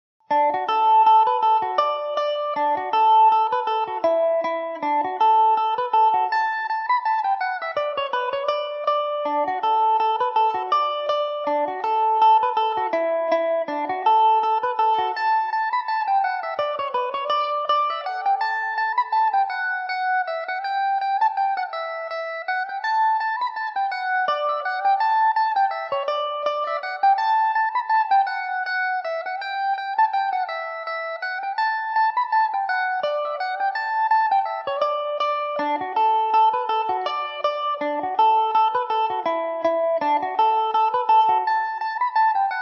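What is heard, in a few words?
D major, Irish mandolin; The Kindness Of Strangers; Epiphone Mandobird VIII electric mandolin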